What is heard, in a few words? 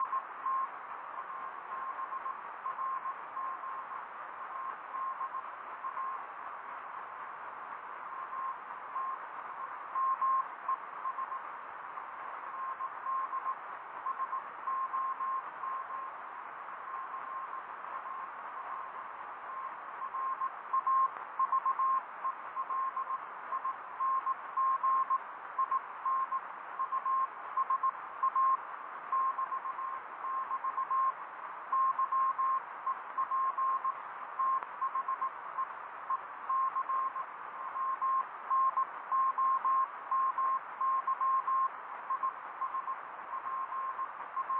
morse